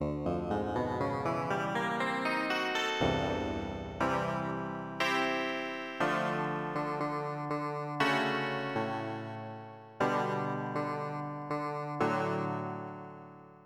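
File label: 6-String Dark, guitar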